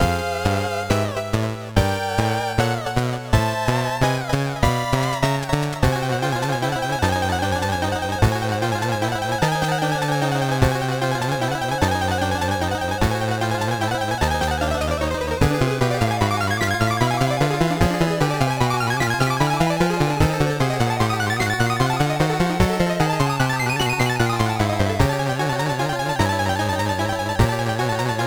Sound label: Boss fight; Akumajou Densetsu; Family Computer; NES gamerip